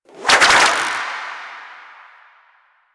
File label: String Whip; Kumihimo; Warframe; Koumei